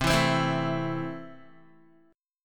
C 6th, Guitar; strummed

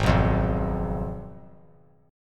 Ab Minor 6th Ab, down, 3C, 3F, 3A, 3D; Abm6 strummed; JGuitar

